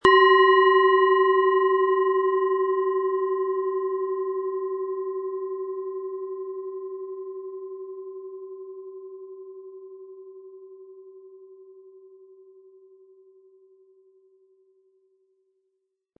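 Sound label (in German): Planetenschale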